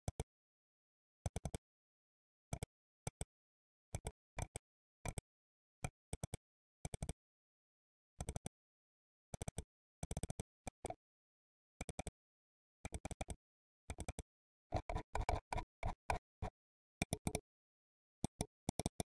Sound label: mouse - ps2; devices accessories utilities; NS Studios - ultra sound collection